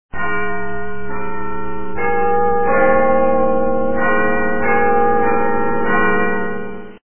big ben clock

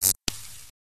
short-circuit

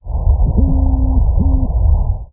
underwater

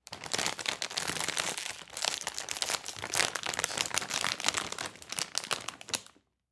Potato Chips Bag Rustle

